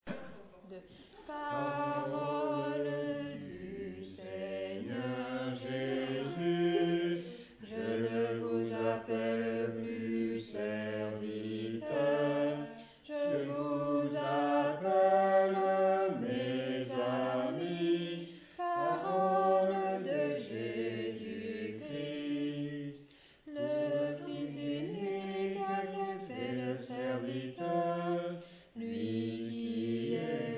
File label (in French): Voix de basse; Parole du Seigneur